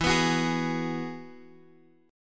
E Major Add 9th, Guitar Open G

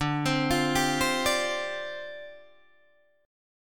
D Minor 11th D down Guitar DADGAD

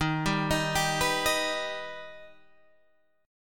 Eb Augmented Eb, Guitar Open G

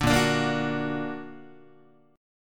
A sharp Augmented A sharp, Guitar; A#+ strummed; 4A,4E,5A,5D,5G#,6C#; guitar chord; 5,6,5,8,6,5

